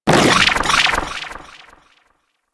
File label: Sanctuary Target Burst; Warframe